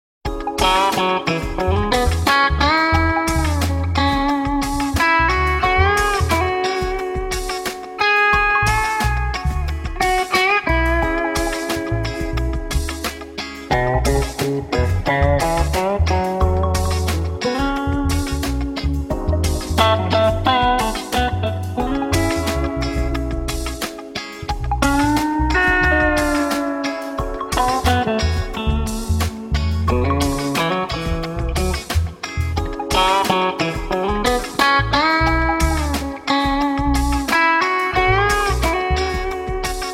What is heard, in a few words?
Reggae Jazz Instrumental; Cafe Atmosphere